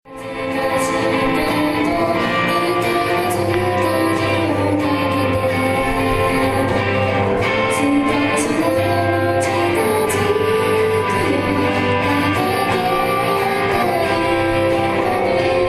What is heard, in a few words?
akogi circle concert